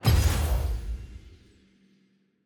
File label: button confirm click; parties; gameselect